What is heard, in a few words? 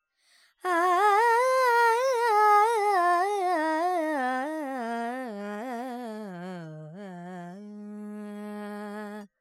Pentatonic, Vocals, Carnatic Female, Alaps, Dry; Sample Banks